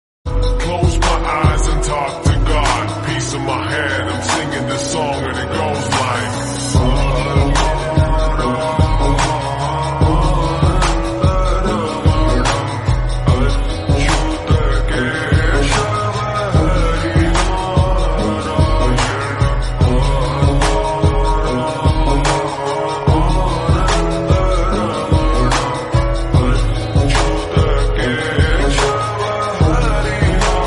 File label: slowed reverb